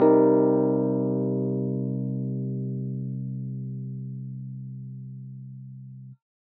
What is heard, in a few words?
Open C minor 7 chord